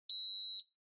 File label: Air Purifier (Beep)